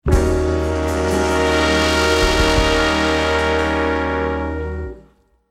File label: deathcam